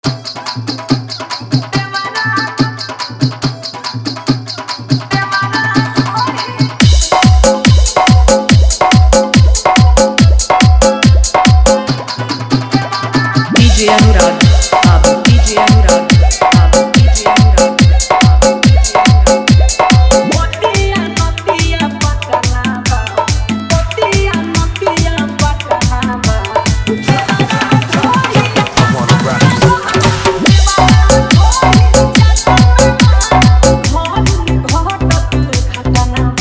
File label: Hard Bass Dance Mix